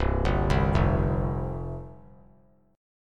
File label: C Suspended 4th C; Csus4 arpeggiated; JGuitar